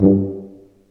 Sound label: TUBA, Solo Brass, Tuba stacc